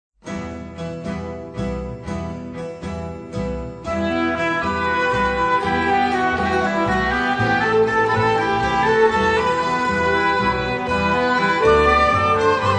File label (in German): Griechenland, Accordion; Syrtos Oduros